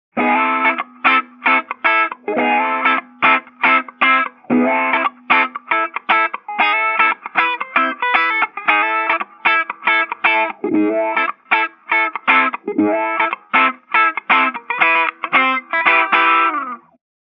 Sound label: Wah Wah Funk; Wahoo